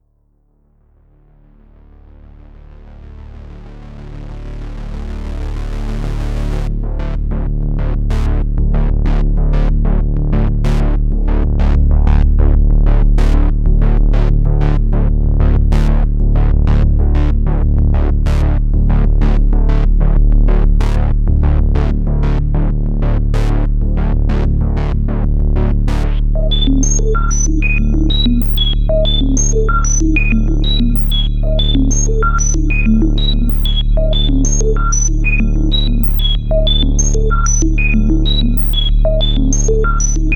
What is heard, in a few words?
Korg Minilogue Filter Resonance